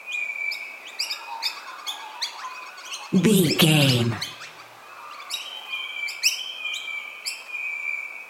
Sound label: birds small calling chirping loop